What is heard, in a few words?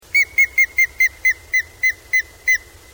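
grey-headed woodpecker voice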